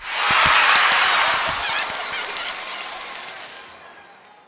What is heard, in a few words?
cheer; World Of Goo